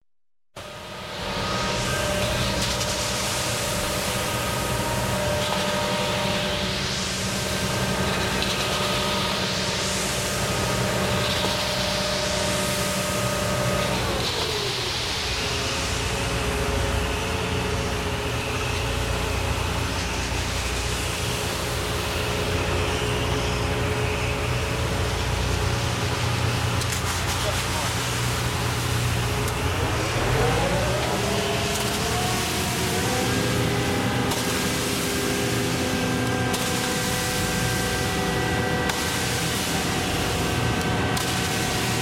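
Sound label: cement truck concrete mixer mixing cement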